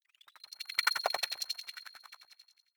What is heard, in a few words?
sci-fi subterranean light up high